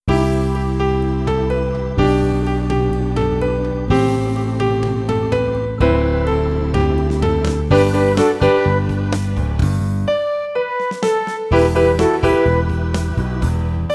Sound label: flute